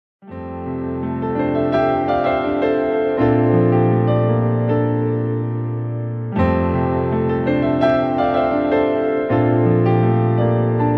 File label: Blu-ray Disc Sample BGM; Panasonic